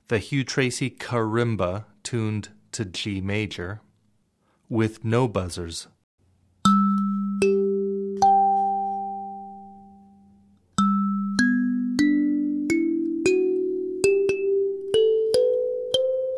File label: Karimba G No Buzz